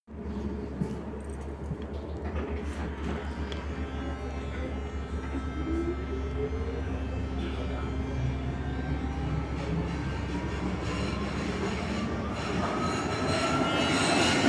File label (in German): U3 Wagen Sound; WeihnachtsmarktExpress 2023